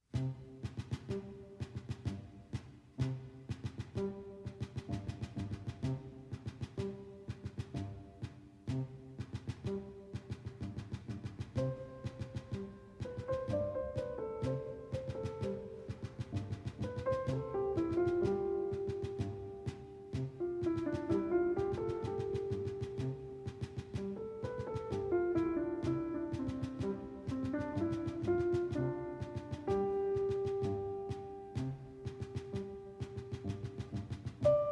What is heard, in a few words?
piano duet and percussions; Boléro, M.81